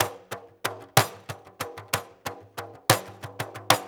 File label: 6-8 Pandeiro, Perc Loops